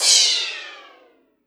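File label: cymbal, Drum & Bass 160 BPM; Ueberschall; Drum & Bass Flash Trackz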